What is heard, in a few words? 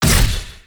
melee impacts; terrorblade